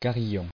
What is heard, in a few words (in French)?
carillon